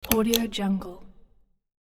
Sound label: light switch rotary dimmer; hollywoodedge